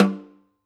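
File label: Snare Off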